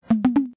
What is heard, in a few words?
chat receive